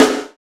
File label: SNR, Cheez Snrs, Roland